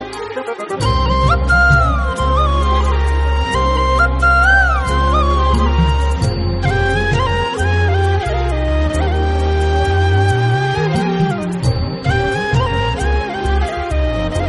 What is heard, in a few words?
flute instrumental ringtone